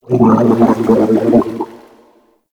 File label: Inked Humanoid Large Attack; Enemies; Warframe; Shadowgrapher